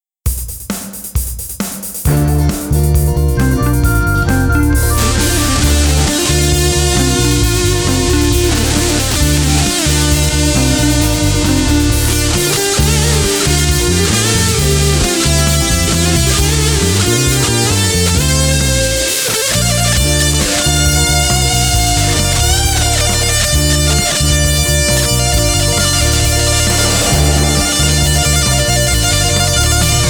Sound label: tube fuzz; helios; thunderchild; effectrode